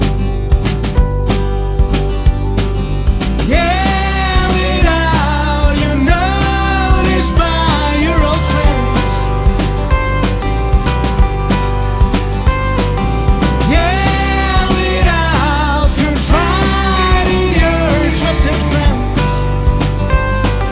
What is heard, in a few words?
yellings; Sealand